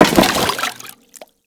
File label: Crewman Land Water, footsteps; Enemies; Warframe; Corpus